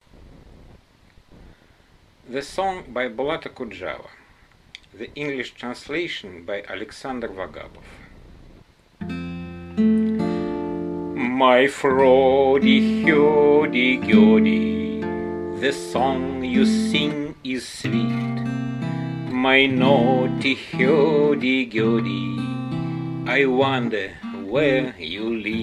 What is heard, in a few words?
Hurdy-gurdy